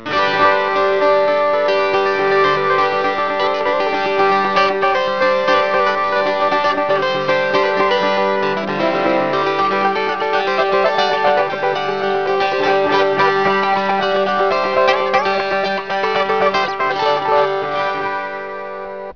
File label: guitar